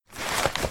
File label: scroll slot sound; Arc of Templar